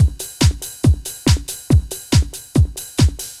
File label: techno 140